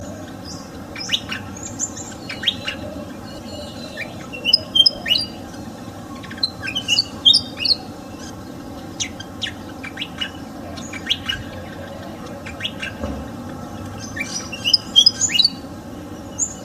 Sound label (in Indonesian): kipasan kebun